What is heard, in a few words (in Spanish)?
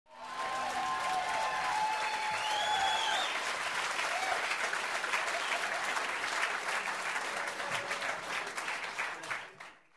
aplausos